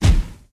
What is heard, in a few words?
Ground Poof; Towers